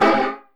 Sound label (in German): TROETE, Anime Sound FX; COMIC